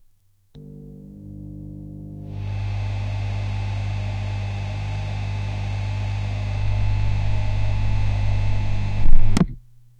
a vintage tube amplifier, electronics

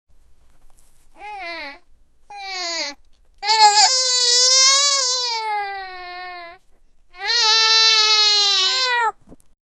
little child weeping

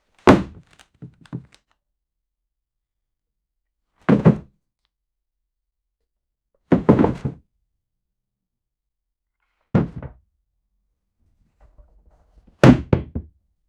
a stack of books falls

